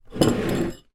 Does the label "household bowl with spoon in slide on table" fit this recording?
Yes